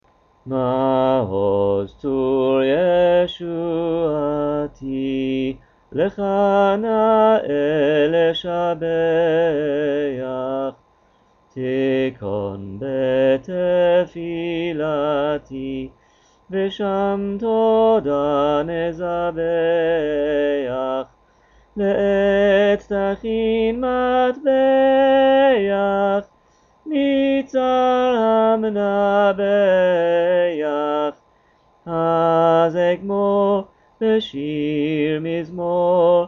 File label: Maoz Tzur German; Hannuka